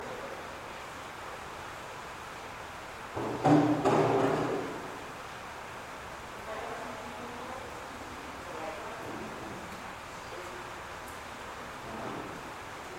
something knocked over

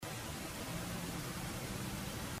question humming

